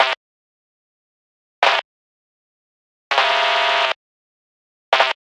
Arcade Click Negative

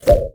Menu Fail Error; Menu FX

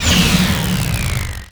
Scifi Forcefield Deactivate; SFX Kit; Energy & Forcefields

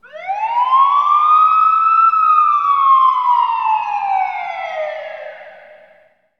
Hockey Goal, Hockey Horns & Buzzers; Washington; SFX Kit

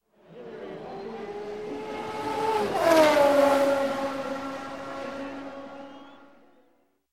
Indy Style Race; Sound Ideas; Series 2000

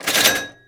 Pickup Coins; Pickups

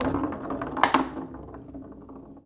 Foosball Ball Hit

